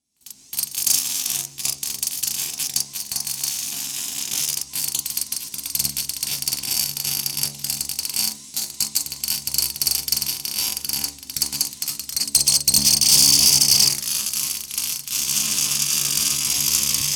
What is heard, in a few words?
Industry Tool Torch Weld